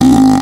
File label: farts